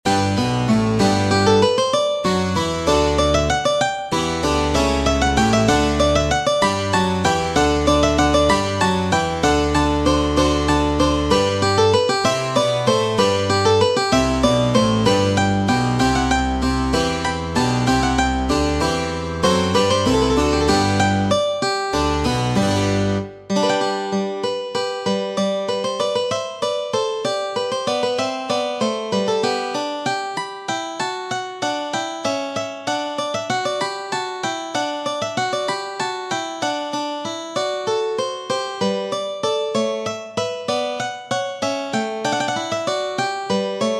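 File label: Allegro, mandolin orchestra; Hasse md conc G dur; zupforchester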